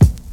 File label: 2000s thick hip hop kick single hit a sharp key